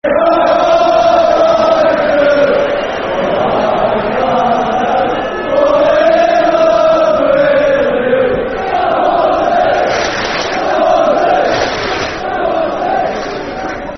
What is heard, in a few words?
[Football Chant (Soccer Song)]; Swansea City Scfc